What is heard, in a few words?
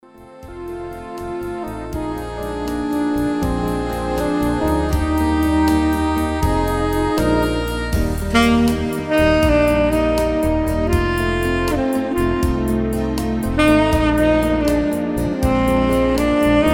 Sax inst